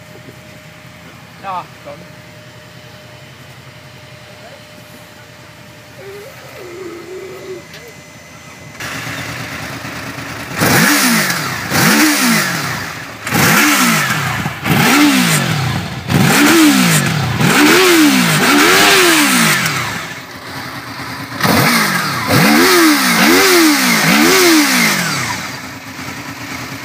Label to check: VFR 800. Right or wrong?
Right